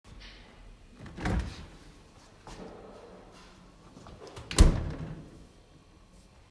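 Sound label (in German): Kühlschranktür aufmachen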